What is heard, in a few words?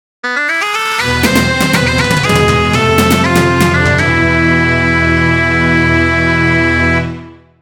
music; temple